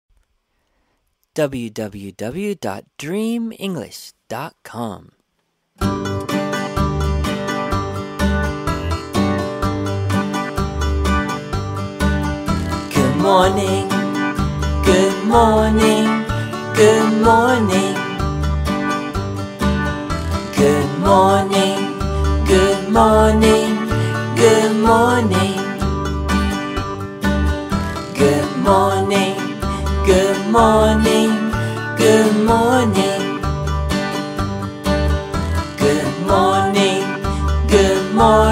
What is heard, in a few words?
Good Morning Song For Children